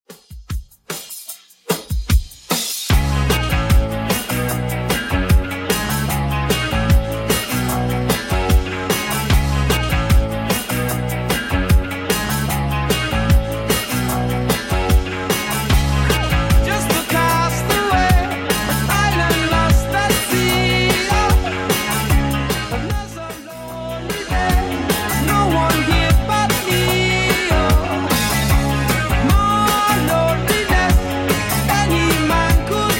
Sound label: 70s Redrum 150bpm